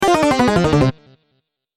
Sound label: Wrong; Quiz Système solaire